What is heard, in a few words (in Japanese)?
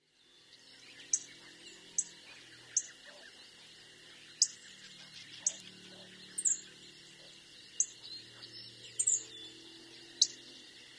hooaka; ホオアカ; さえずり